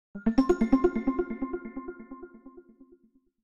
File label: New Electronic Sms